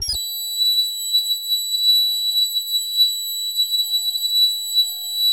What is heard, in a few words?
HI FREQ, PSYCHO